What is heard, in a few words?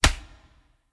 Slap Face; blue archive